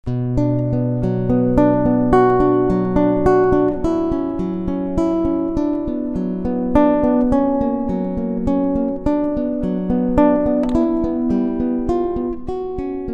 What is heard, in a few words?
Etude B minor